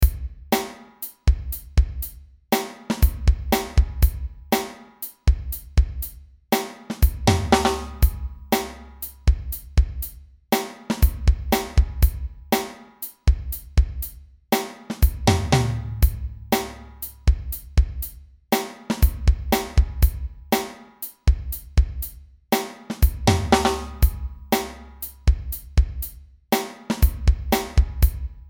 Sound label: Tight Funky Foot; admiralbob77